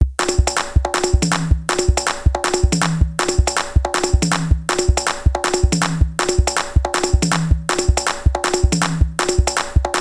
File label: Click Tracks for Gospel